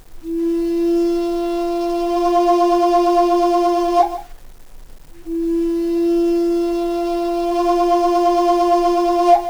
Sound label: warble, bear flutes